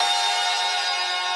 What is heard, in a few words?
synth main